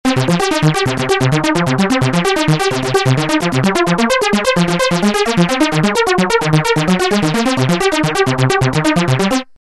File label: 90S HOOK; mfb synthII